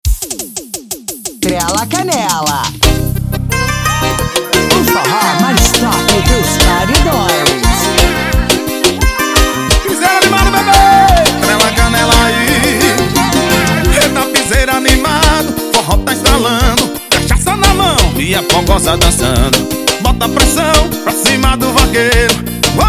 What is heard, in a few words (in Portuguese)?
forro noite quente